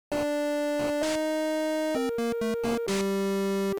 Arcade game 16 bit or 8 bit mission failed game over; Game Over Sound Effects; the sound of losing a life